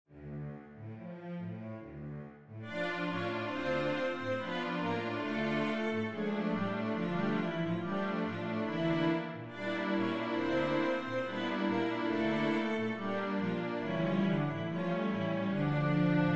habanera, cello

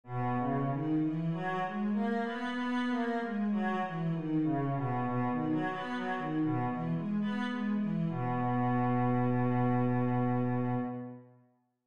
cello B major scale

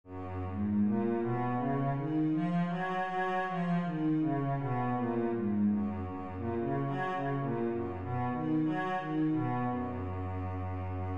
cello F sharp major scale

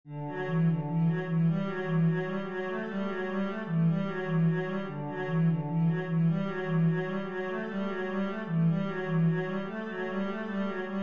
etude, cello

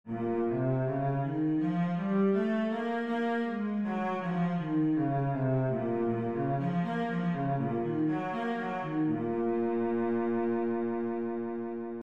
b flat minor, scales, cello

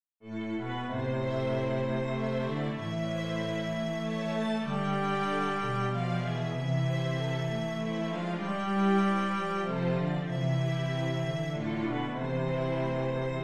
barcarolla, cello

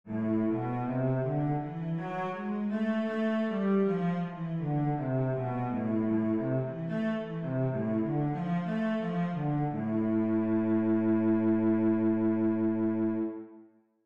a minor, scales, cello

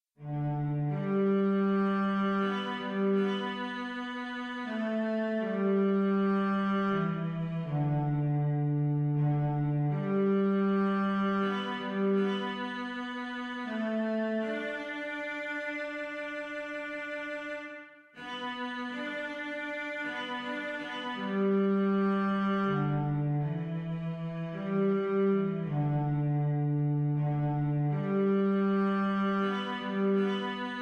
amazing grace cello

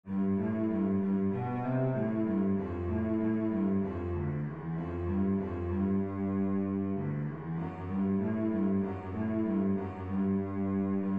Columba cello

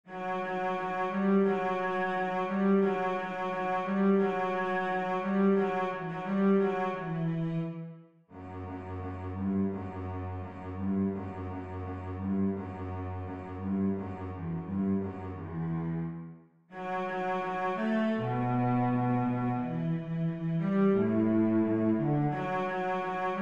cello melody; fourseasons; autumn

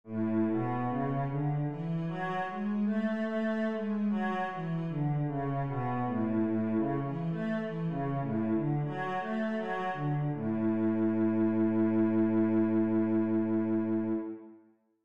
cello A major scale

